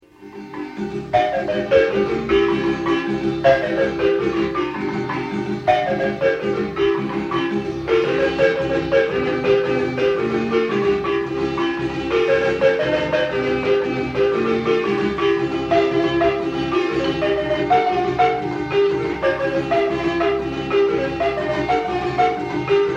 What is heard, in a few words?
marimba